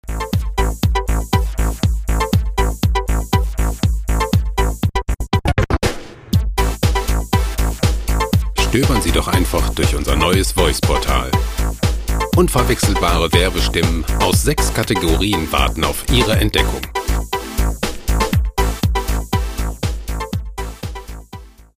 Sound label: turntable